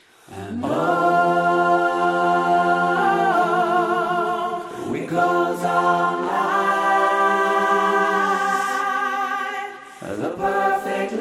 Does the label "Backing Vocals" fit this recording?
Yes